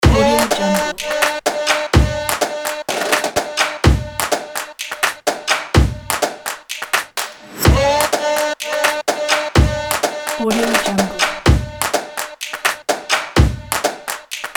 fashion stomp; pchelaudio

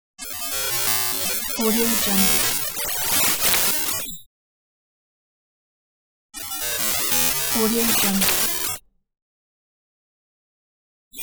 8bit glitch

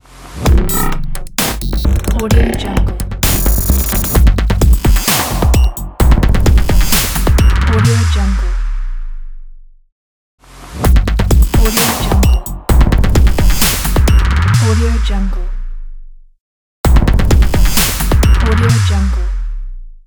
dubstep tech ident

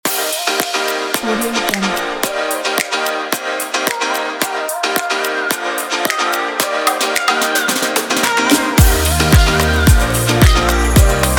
pop intro logo

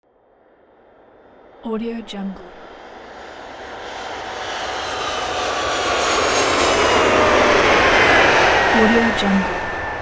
airplane

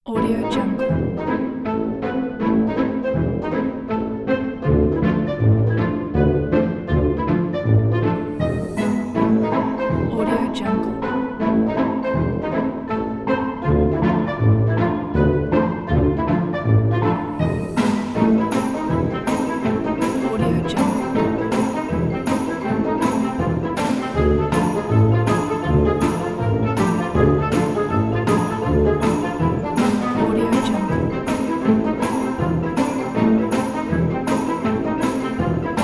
this is funny comical

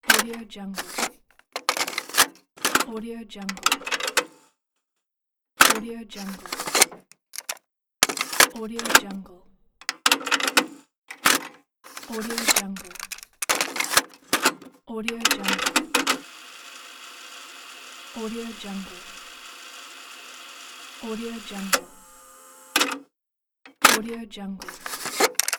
cassette deck pack

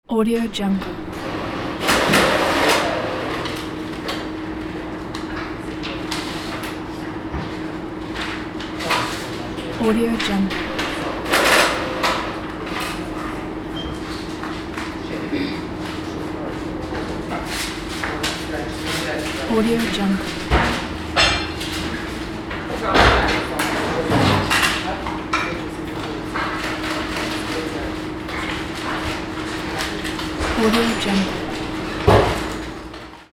post office ambience; alexm76

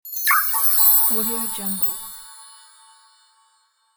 magic drip

